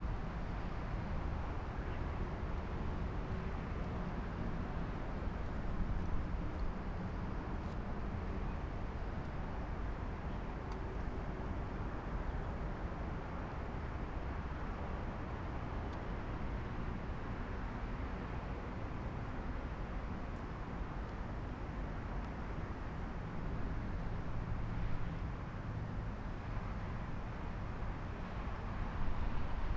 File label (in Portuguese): Transito ao longe